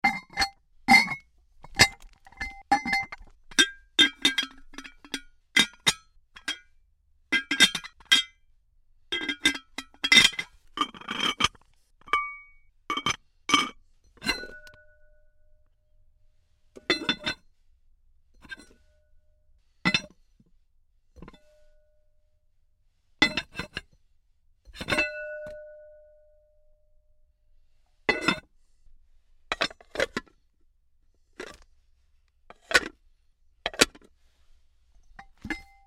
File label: Pot Lids Ceramic Various, Lids on and off various jars and contain; Lids on and off various jars and containers; Dishware; Tom Hutchings